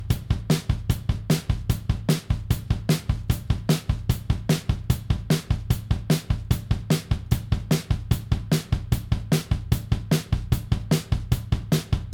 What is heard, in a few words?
DRUM HARDWARE; EAR